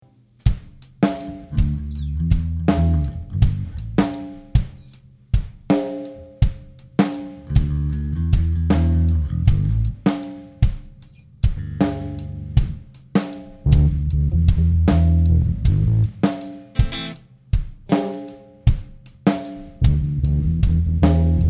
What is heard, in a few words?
blues jam